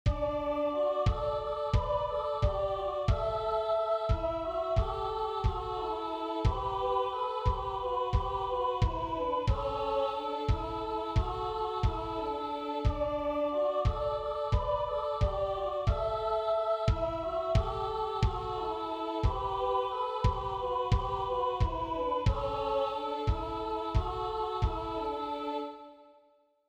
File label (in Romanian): Coborat a coborat, Ardeal, colinde